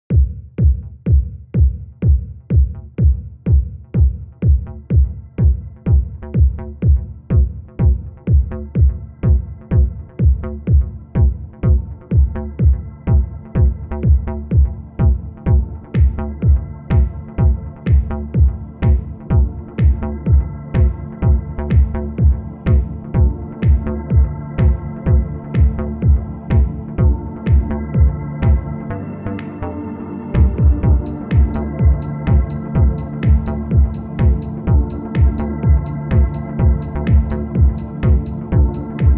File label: House